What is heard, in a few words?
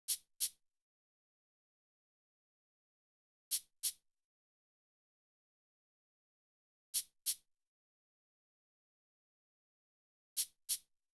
Drum Loop 140 BPM Percussion; Cymatics; Mystery Pack Vol 4; Sturdy Drum Loop